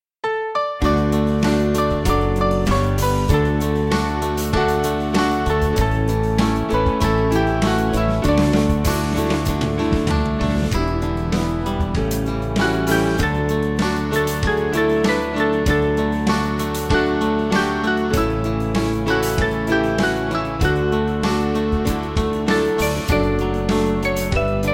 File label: Blessed Jesus, Band